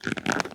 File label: Hub-Train Strap Squeak; Sx Hub-Train Strap Squeak 07